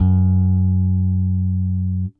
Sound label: Whole Notes F#, Bass; Construction Kit; Bass X